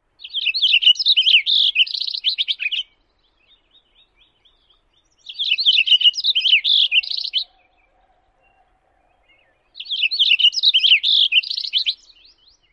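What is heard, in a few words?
song, Cassin's Finch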